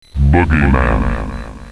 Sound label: Bogey Man Cackle; halloween